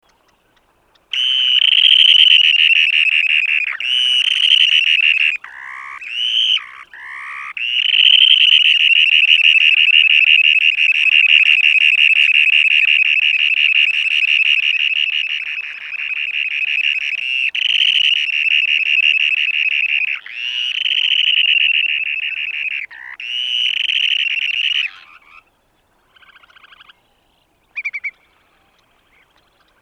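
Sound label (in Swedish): kärrsnäppa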